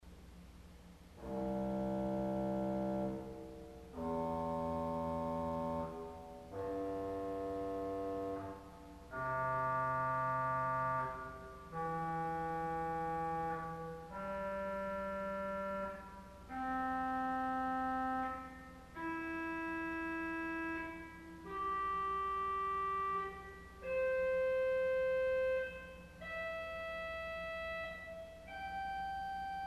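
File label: Clarinet Arpeggio, organ stop; CulverAcademy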